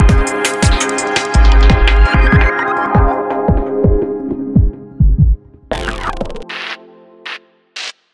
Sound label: jingle